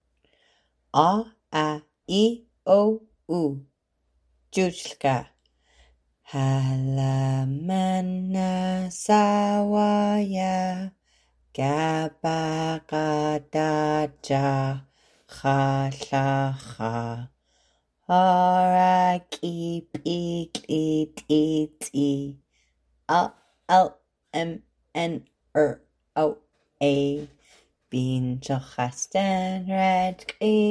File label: alphabet song